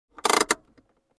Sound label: Car handbrake